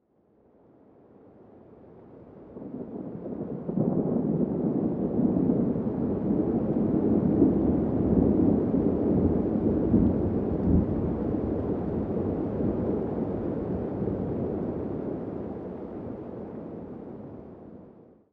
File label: anbience rain nearby Thunder random; Aragami